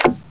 no ammo; Terra; TERACIDE

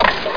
FUSION

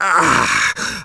burn pain; Shadow Man

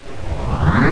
zoom in

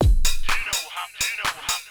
PERCLOOP HOUSE 125; eJay

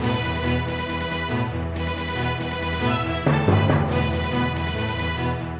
NEWS FLSH; Direct Loops

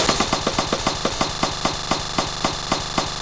Wheel Stop; Funhouse of Love

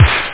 compu snare; snare samples; Amiga 8-bit Sampled Voice; Mecomp Multimedia 1

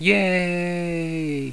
"Yay"; Echo 1.1

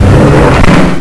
jail; Desert Trek 1.02

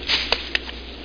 tree; judge

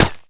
player hit; Big bang

